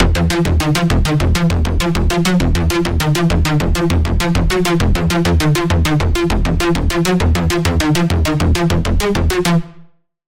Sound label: Bass, Sylenth1; Routed Sound; Sylenth1 Expansion - Doom Carpenter Vol.1